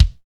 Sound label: BEATER KICK; NATUR. K1